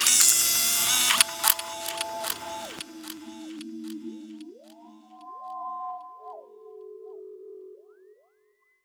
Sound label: Auto Shutoff, FX Atmospheres; Zero-G; Soundclash Nu Dance Electro; Acidized Wavs